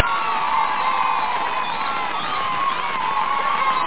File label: "ah"